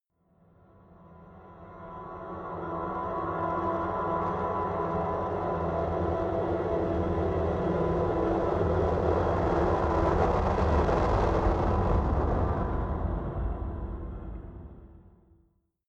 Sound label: rise air distortion, risers